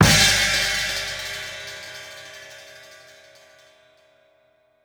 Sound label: Snare, Rattle, Kick; Essential Percussion